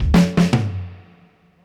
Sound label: Fills 77; Zero-G; Monster Beats